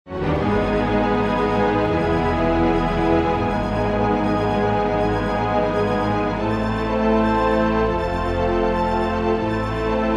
panic

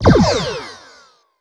warbird torpedo

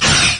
Polaron Beam

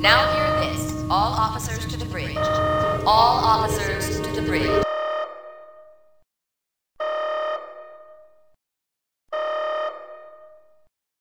yellow alert; EnterpriseA; TMP

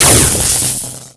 console explo, Bridge; sfx

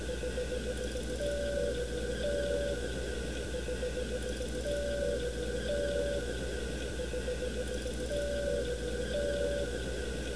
ambient; TMP

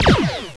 disruptor pulse; vorcha

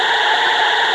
phaser; Weapons; borg

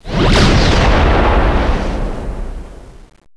Borg Nanites

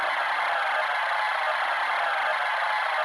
romulan phaser; Weapons

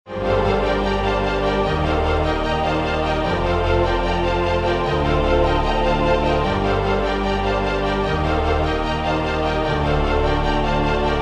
confident

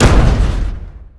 shield; NanoFXv2; ExplosionFX; ExpShield

